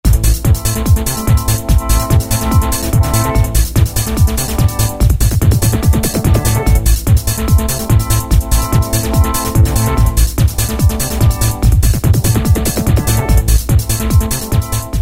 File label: Trance Pattern, Roland MC-303